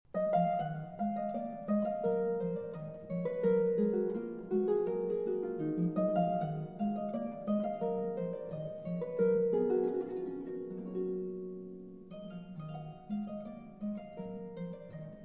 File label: Lute Suite in E Minor Bourrée; BWV 996; J.S. Bach